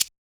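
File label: Hi-Hat FX Closed; Yamaha DJX-2B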